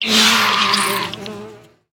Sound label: Sandwasp Queen Death